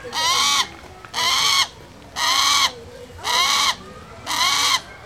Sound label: Hawk